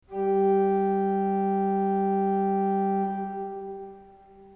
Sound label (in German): ton, orgel